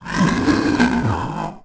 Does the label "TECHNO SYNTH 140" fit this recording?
No